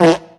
fart short

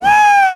woo

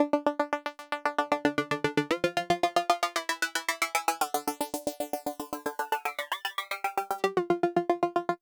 merry harp; Loops; Electronic

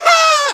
ha

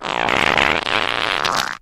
fart long

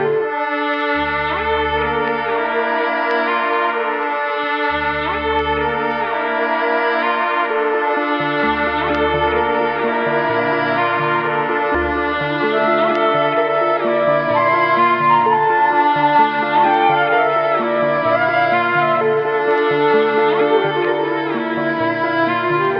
strings, sad

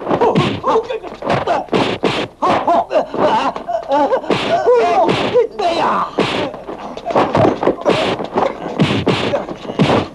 martial arts

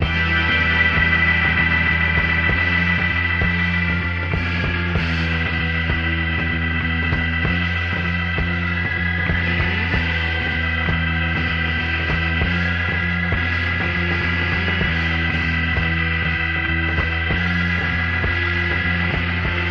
keys, Sad; loops

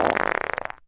fart medium

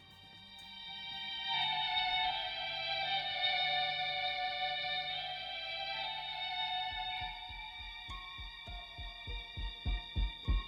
strings, Sad Loops

